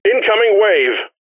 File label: Wave Alert Generic; Deep Rock Galactic Boardgame; Mission Control; Swarm